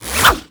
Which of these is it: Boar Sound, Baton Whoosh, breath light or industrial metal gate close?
Baton Whoosh